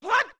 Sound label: Shout; Zora Link's shouts when rolling or attacking